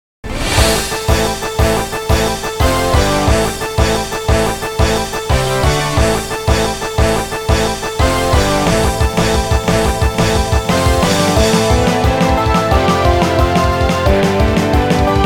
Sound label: music raid; Legend Quest; android game music